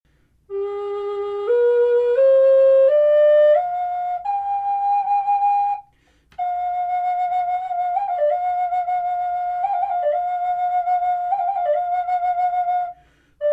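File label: natural, flute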